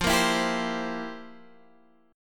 F Major 7th Suspended 2nd Suspended 4th, Guitar, Open G; x,10,8,9,8,10; down; chord shape